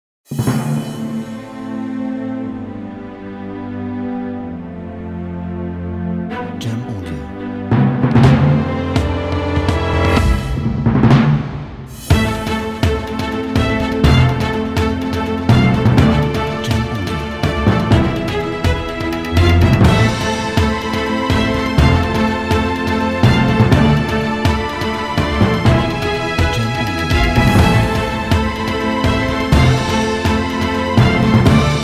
Cinematic Trailer Action

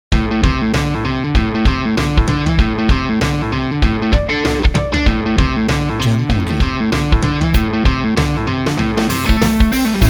Rock Background

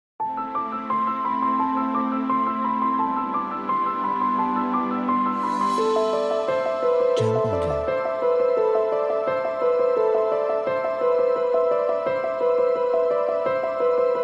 Emotianal Piano

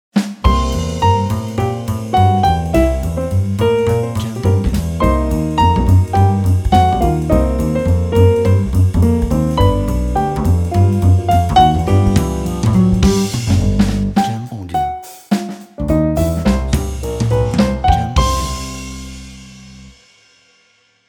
Jazz Upbeat Intro

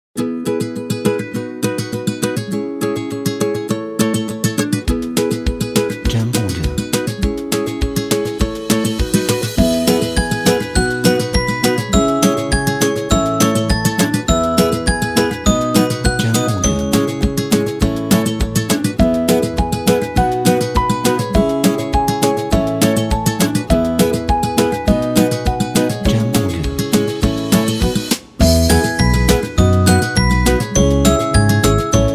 Upbeat Happy Background

